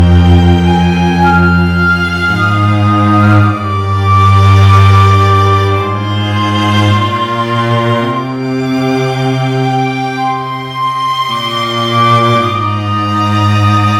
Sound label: instru mix